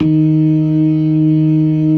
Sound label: LOW MAN, ORGAN; Synth and Organ; InVision Interactive; Keith Emerson; ORGAN SPLIT